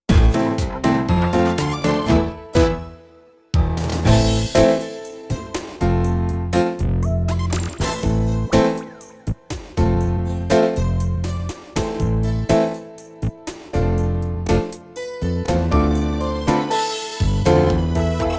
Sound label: Duet (A minor)